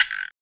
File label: Guiros